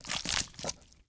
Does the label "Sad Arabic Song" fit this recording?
No